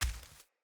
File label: Mockery Walk Veg, Footsteps; Mockeries